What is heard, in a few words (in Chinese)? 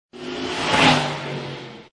加载BGM; OvertakingLegend